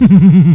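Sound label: evil laugh; xeen; Creative Voice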